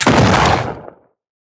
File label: Shotgun Shot; Weapons